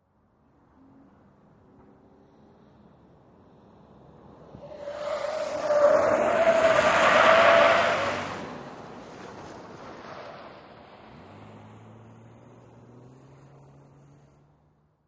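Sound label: SUV Truck Accel Corners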